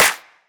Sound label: Big Clap; Cymatics - Odyssey